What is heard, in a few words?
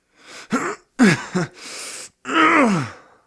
low health; police